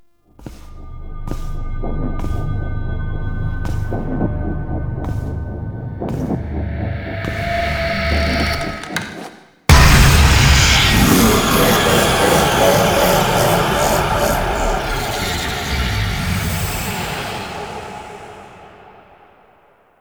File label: Void Twin Jumpscare; Warframe; NineteenNinetyNine; Stage3RideOrDie